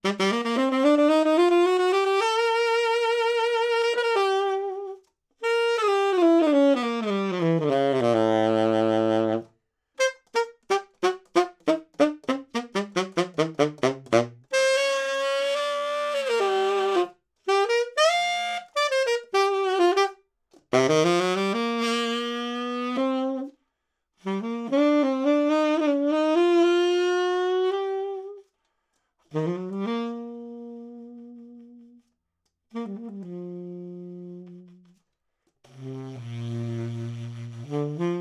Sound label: Sax Tenor 50cm C Low